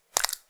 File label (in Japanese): 普通, ぱちゅ音単発